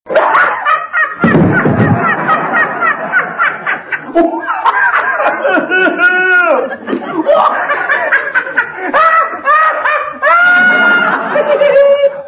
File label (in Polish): Smiech